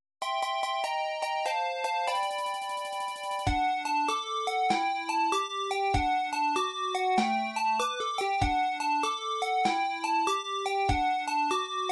stage clear; gameboy; sequenced; balloon kid

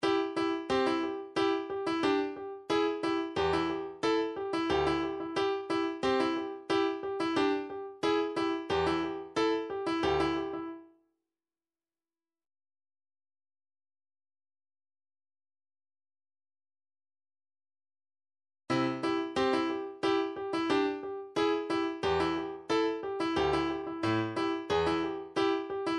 middle of the road 90 bpm

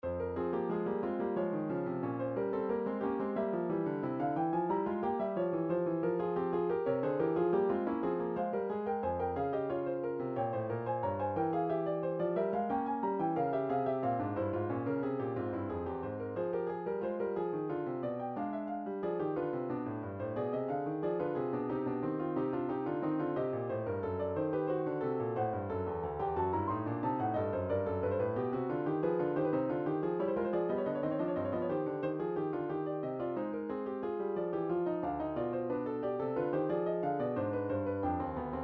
piano, midi

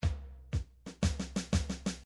midi drums march

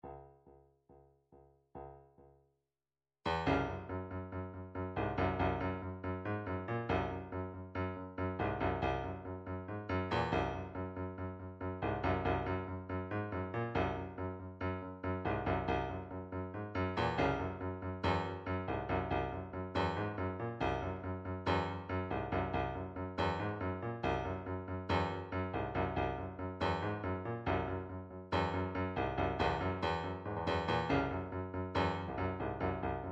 drumtrax r b 140

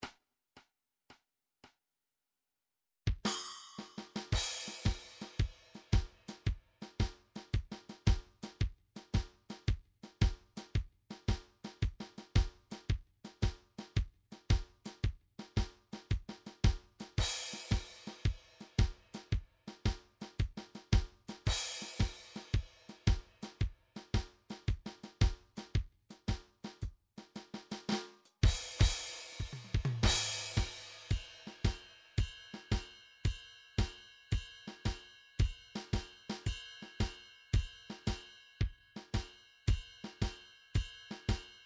blues 112 1 4 kick swing ride; Sonar

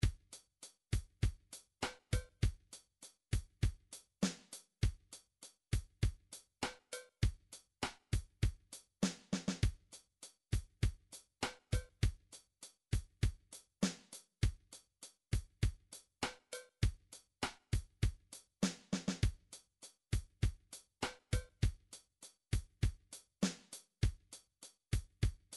midi drums ballad

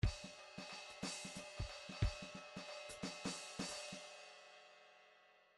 grooves 3 4

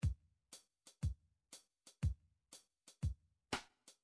midi drum patterns drums dixi

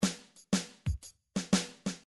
midi drums, soul

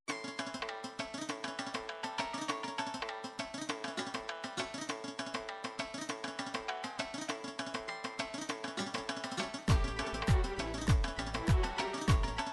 folk arabic bhangra, midi

